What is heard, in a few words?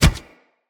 Run Metal, Footsteps; Kubrow